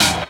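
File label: snare drum, Drum & Bass, 140 BPM; Ueberschall; Drum & Bass Resonance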